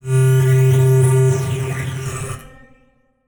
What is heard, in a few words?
Title Transition Power Source; Production Element